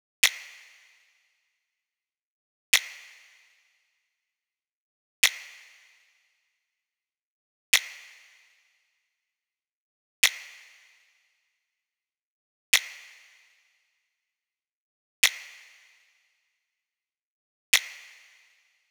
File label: Drum Loop, 96 BPM Snap; Loop Stems; Cymatics; Vibes II Drum Loop Collection; Forgotten Drum Loop